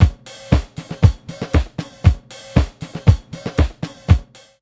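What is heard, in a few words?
beat, right, roots disco; USB Soundscan vol.32; AKAI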